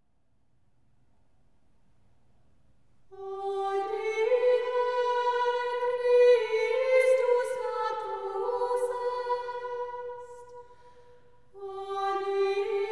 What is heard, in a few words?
Hodie Christus natus est antiphon chant